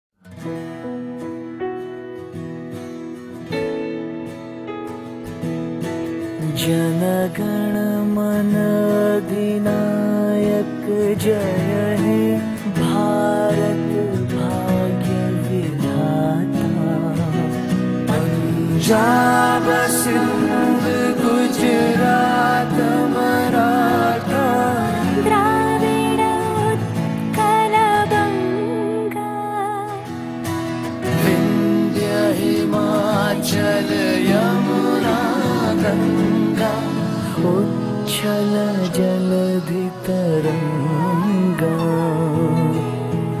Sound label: national anthem jana gana mana